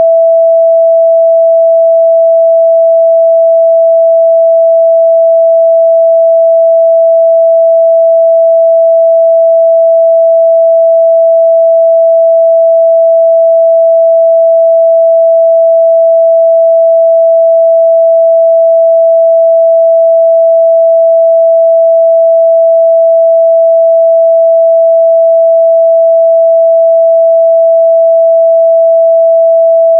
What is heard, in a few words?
Tone generator E5